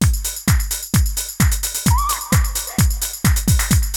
house, loops

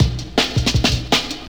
160bpm, breakbeats

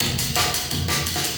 breakbeats

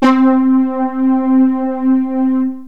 syn, loops synth

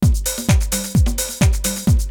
house, loops

